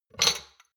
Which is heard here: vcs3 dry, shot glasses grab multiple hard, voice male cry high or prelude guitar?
shot glasses grab multiple hard